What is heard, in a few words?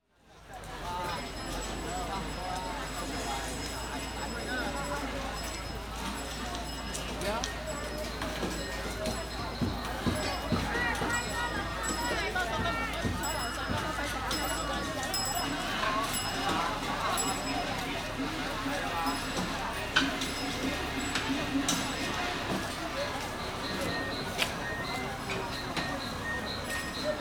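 Market Hong Kong, exterior atmosphere ambience with people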